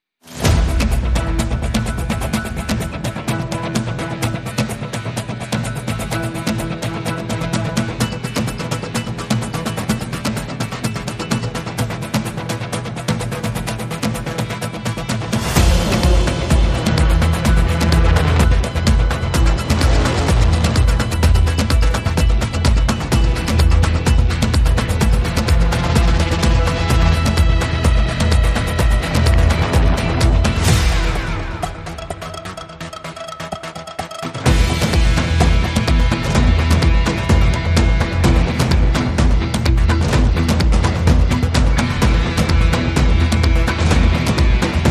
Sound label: No Strings